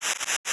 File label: Game Over; GNU Backgammon